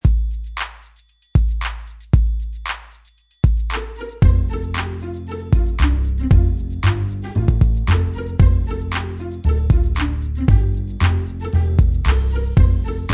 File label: HipHop